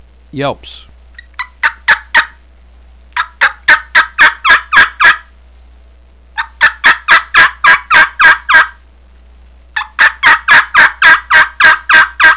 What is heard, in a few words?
yelps, mouth